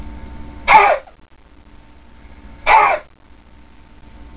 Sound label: doe cough